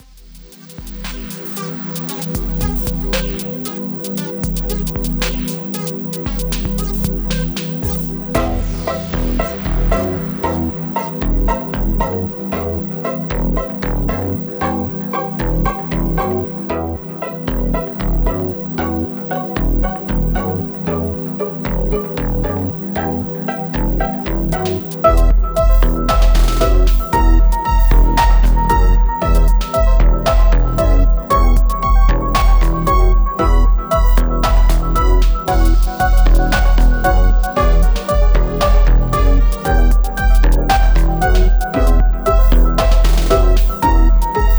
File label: Electro Trap Remix; RBY; pokemon halloween megamix 2020